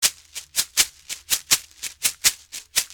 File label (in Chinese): 波琴